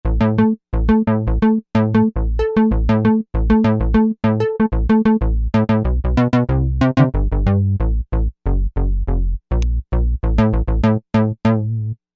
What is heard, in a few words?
Juno-6 Bass